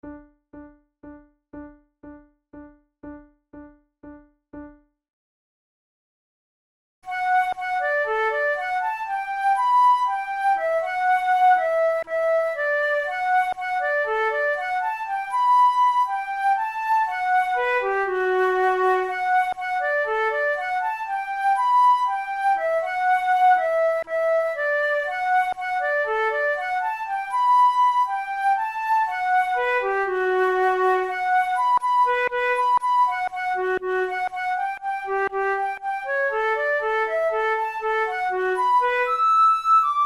duet; Oh come where the roses

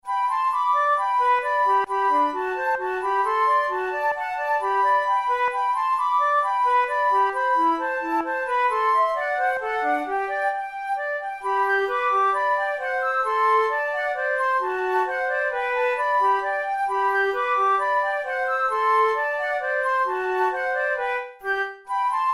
duets, flute; kohler

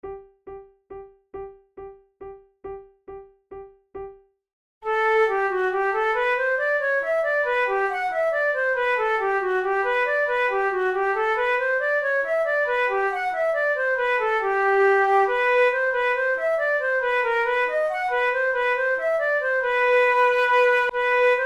waltz duet, flute; mercadante

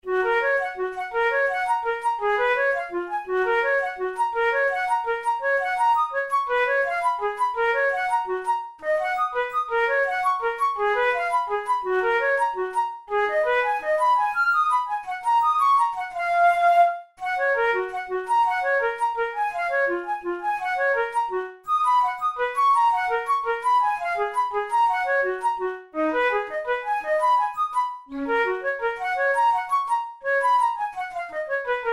studies for the boehm flute; drouet 72

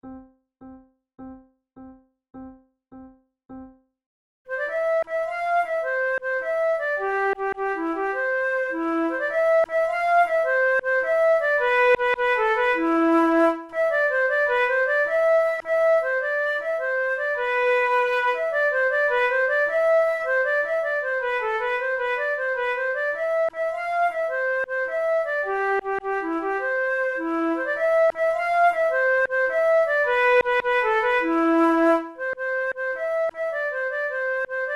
duet

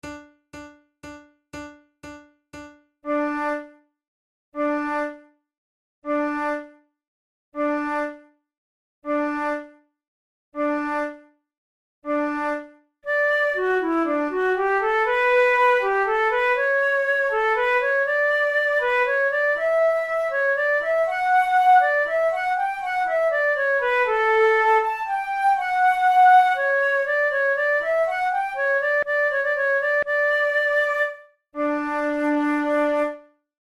prelude, flute

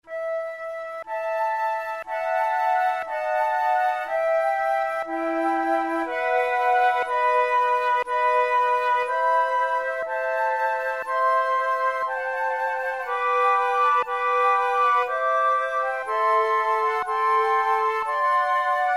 sonate en trio, lentement, flute

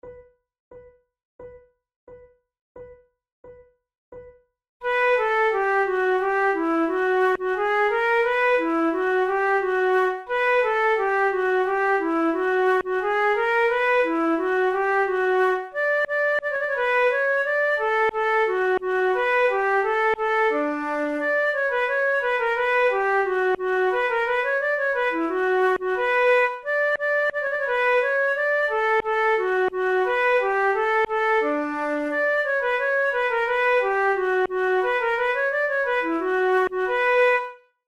concerts for two flutes gavotte; monteclair

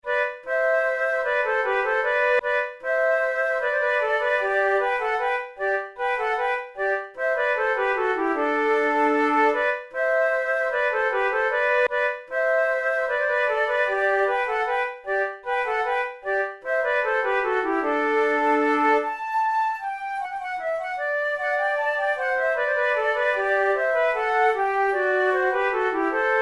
menuet en muzette, flute

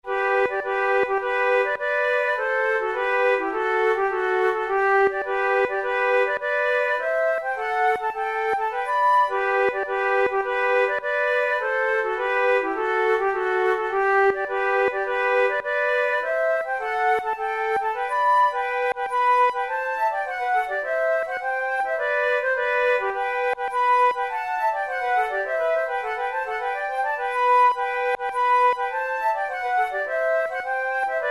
duet, flute

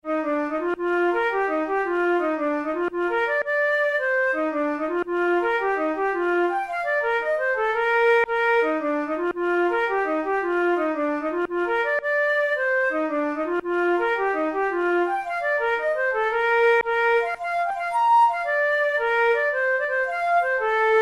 jig; Miss Mary Douglas

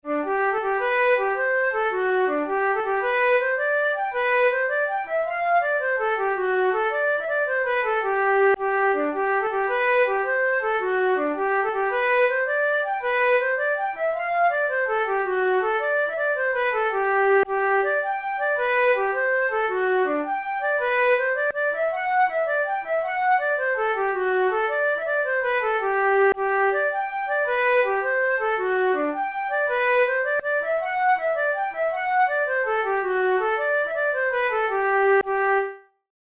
jig, flute, play-along accompaniment

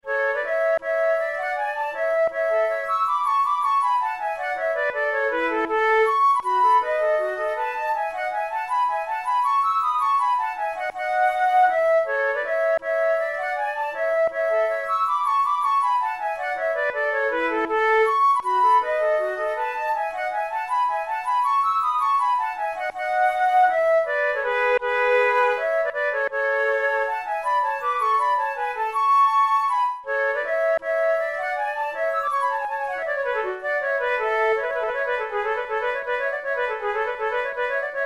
duos allegro moderato, flute; devienne; nouvelle methode pour la flute 18

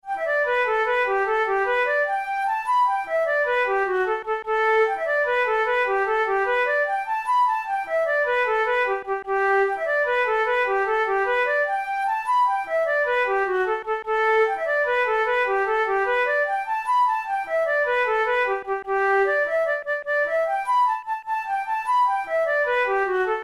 jig, flute

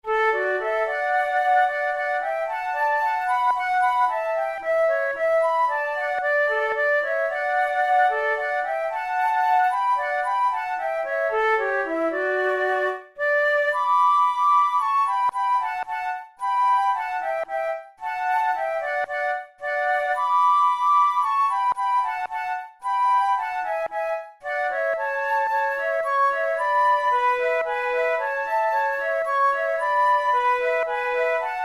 duet, flute

